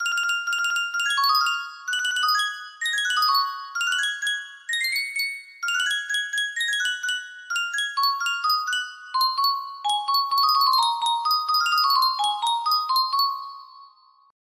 Yunsheng music box Christmas medley, music box melody